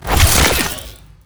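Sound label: elec drill, attack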